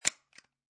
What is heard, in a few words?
Stapler Single Staple Into Paper, Office, Stapler, Single Staple Into Paper